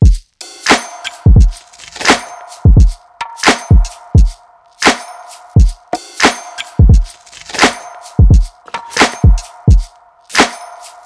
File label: Drum Loop; Cymatics